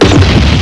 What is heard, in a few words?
FIRE BIG